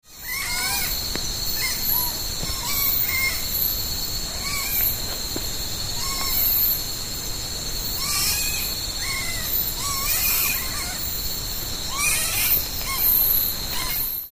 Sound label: kenaganezumi call; yanbaru